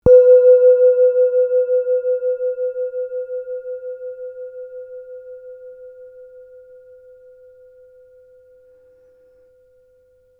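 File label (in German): klangschale sonne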